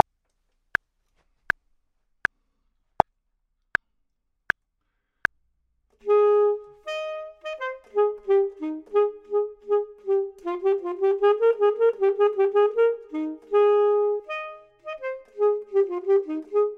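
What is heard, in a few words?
bottom, Saxophone and Clarinet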